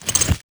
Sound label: Powerup Equip